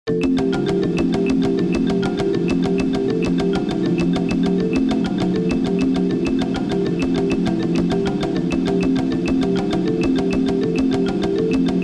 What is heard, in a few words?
Percussion Experiments